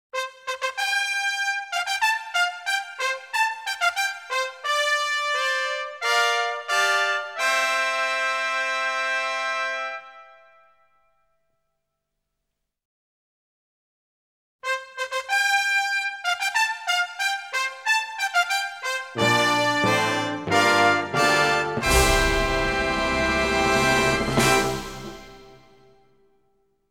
Classic Fanfare

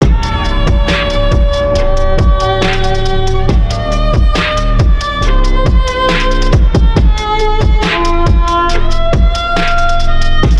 Sad Hamster Violin Meme, ULTRASLOW REVERB

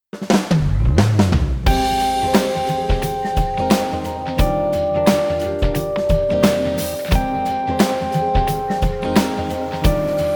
Rainbow Instrumental; Romance is a Bonus Book OST

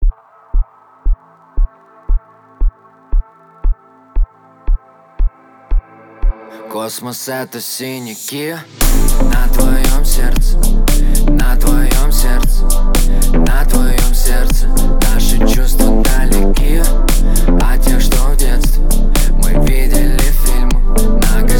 25 26 28hz Low bass

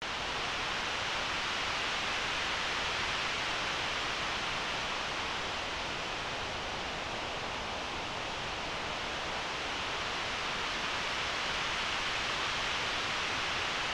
Box Fan Noise; ASMR; for Sleep

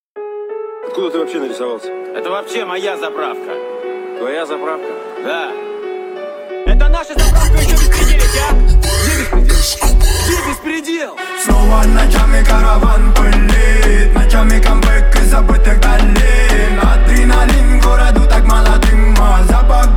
Low Bass 41-46 Hz

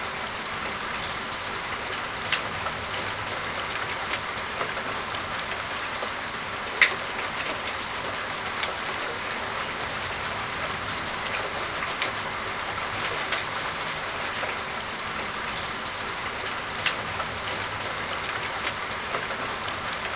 Soft Window Rains

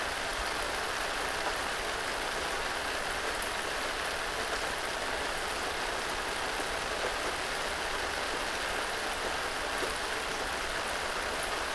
Rain and Thunder

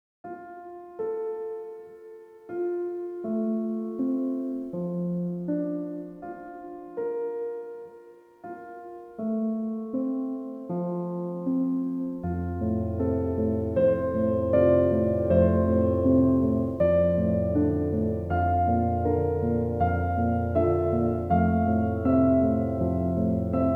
Relaxing Classical Piano Music

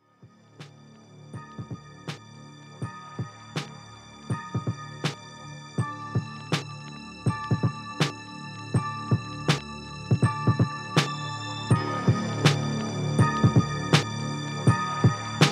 26Hz and Up Low Bass

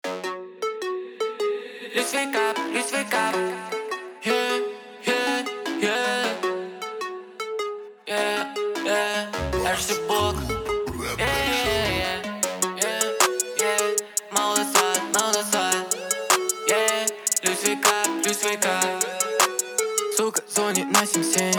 23-26hz, Low Bass

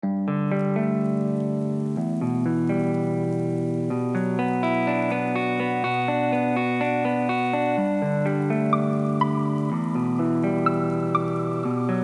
RAIN DROPS Type Beat

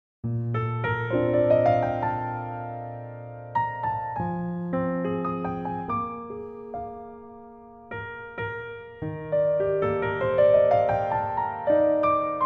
Relaxing Piano, Jazz

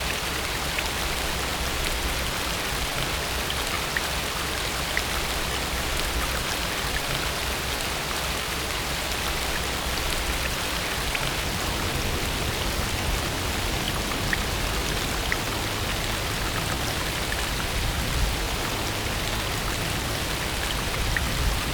Trickling Stream; Loopable NoFade

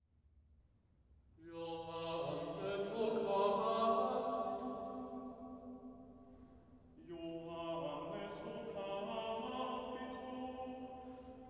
Chorus; Joannes vocabitur

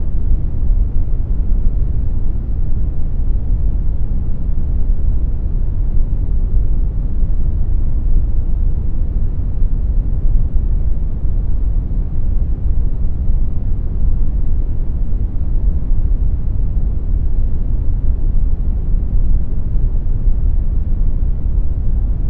White Noise 377hz Loopable

